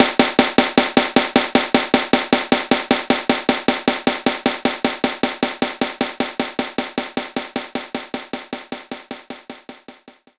snare D1; knights try

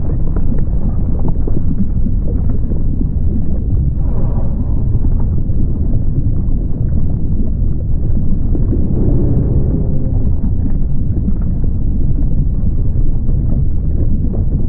Ambience (Volcano); donkey kong country returns 3ds wii wii u 2010

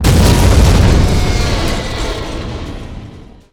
Explosions Total Destruction of War Machines

